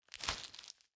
gather fruit

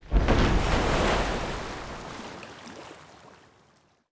warship movement; ships siege

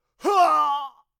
male death

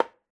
sling wood, attack impact

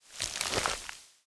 gather fruit expire

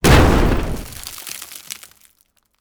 siege projectile hit, attack impact

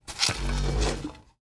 gather field expire

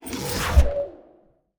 ballista attack

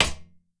arrow metal, attack impact

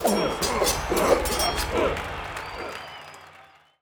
Theatre, completion; 0AD

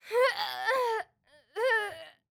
female death, human

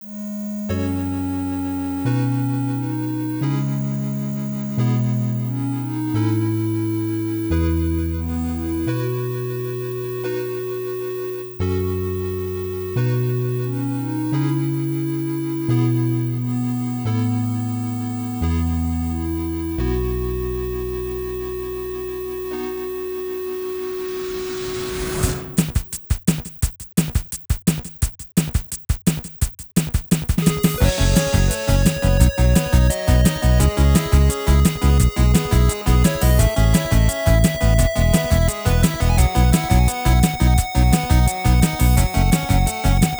8Bit Bonus Track

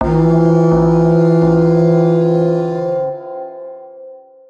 alarm alert; interface

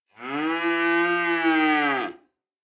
cattle; fauna

